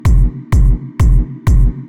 verby techno train kick sub; drum loops

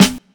boom snare G key; snare drum samples; drum single hits one shots

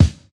thumpy kick drum single shot f key; kick drum samples

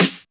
sparkle steel snare drum sound f sharp key

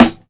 good steel snare drum sound a sharp key

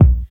dry bass drum single hit b key

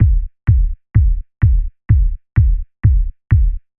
tight kick; drum loops

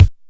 rich mids kick drum single shot f sharp key

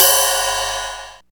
crash sound C sharp key

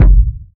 kick drum f sharp key; kick drum samples; one shots

